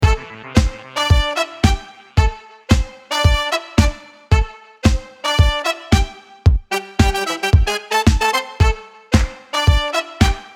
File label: Brass Acid Disco